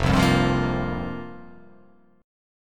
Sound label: F Suspended 2nd Suspended 4th F down